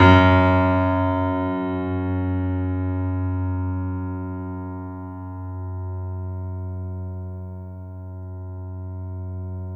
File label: F#, piano sustain